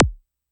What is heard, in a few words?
Tempest 808 Style Kick, Drum Machines; 300 Drum Machines; Dave Smith Instruments